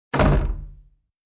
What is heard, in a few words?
Old Wooden door close